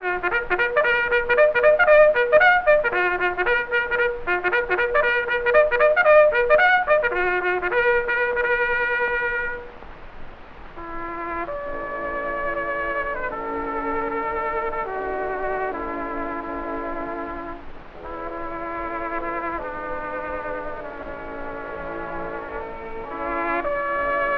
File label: trumpet solo with orchestra, saxophone